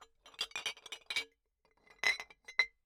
Bottle Struck

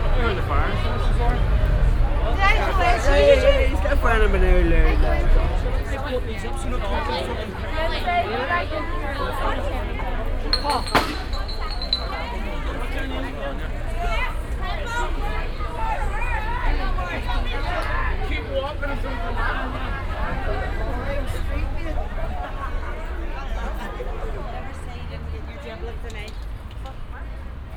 Belfast At Night; Sound Scapes

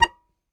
Very Short Note, Melodica

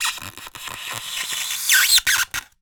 Polystyrene Squeak

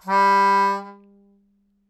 Melodica Long, Long Notes Chromatic

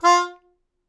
Melodica Short; Short Notes Chromatic

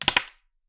Palette, WoodenPalette

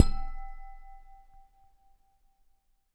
Bathroom, Lampshades

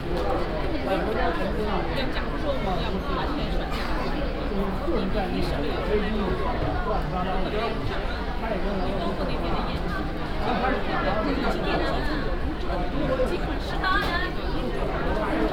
Chengdu Departure Lounge, Airports Airplanes; Sound Scapes

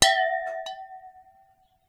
Water Clink, Glass Clink In Water

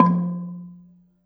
Gambang Soft Beater, Gamelan

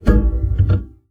Classical Guitar Extended Technique

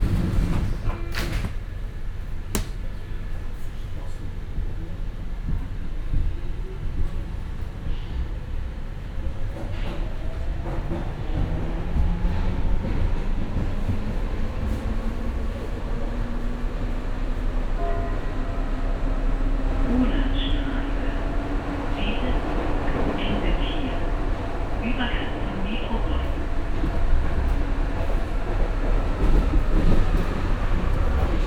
U Bahn Berlin; Sound Scapes

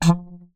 Melodica Short Note

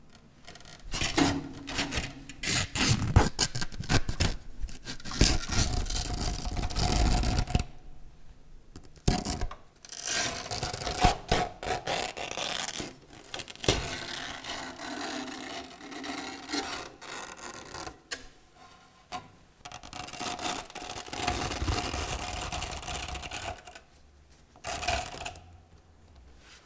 Material Tear, Piano Destruction

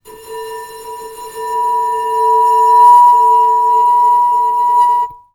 Bowed, Large Wine Glass

